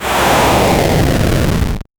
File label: fireball sound effect, flame burst